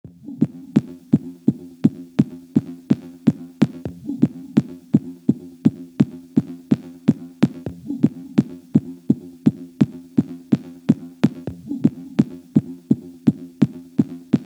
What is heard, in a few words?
original mix